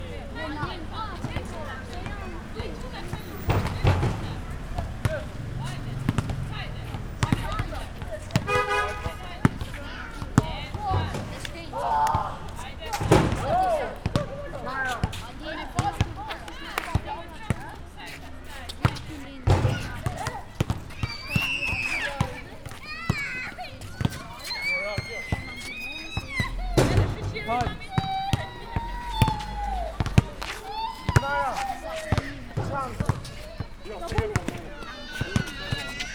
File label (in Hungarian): kosarazgato fiatalok